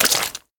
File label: Footsteps Swamp; We Are the Dwarves